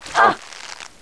attack; balram

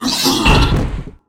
Anger butcher death

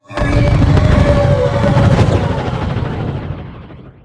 death; gigan

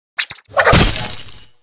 twin attack

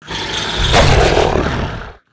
attack

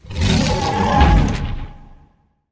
Zombie Warrior death

